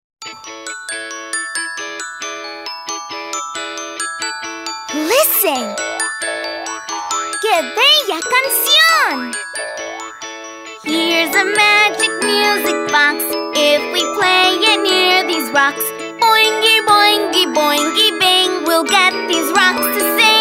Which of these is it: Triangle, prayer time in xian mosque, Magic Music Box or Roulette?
Magic Music Box